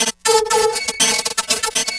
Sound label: Crunchy, Beats